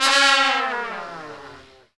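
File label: trumpets FX, Brass; Roland L-CDX-03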